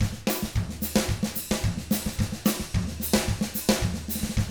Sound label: 110 SAMBA, Drums; ILIO; Double Platinum Drums 1